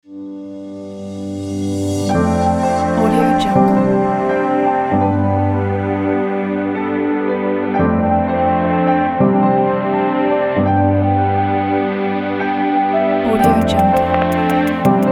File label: upbeat inspiring romantic